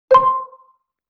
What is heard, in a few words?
Xylophone Flam C; Vintage Cartoon; Musical Elements